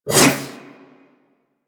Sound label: Kunai Whoosh; Stalker Kunai